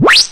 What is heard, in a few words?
Squeal Rev; Proteus 2000; Digital Sound Factory Proteus 2000